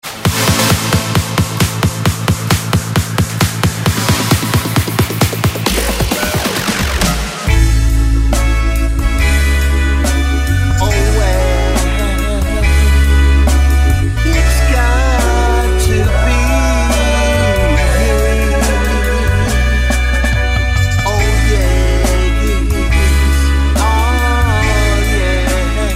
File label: liven naturally dance tech dance house